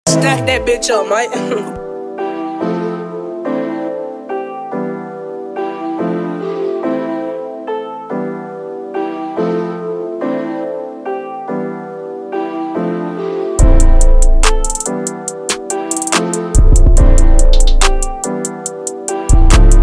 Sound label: TRAP INSTRUMENTAL